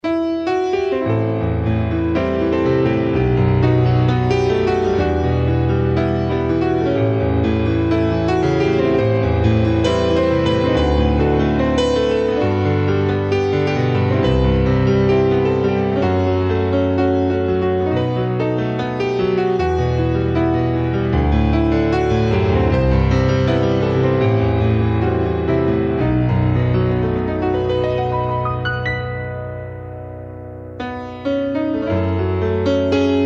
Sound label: Solo Piano